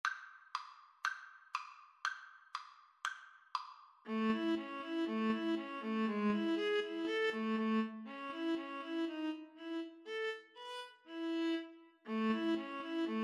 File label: VLN VLA duet, Violin Viola Duet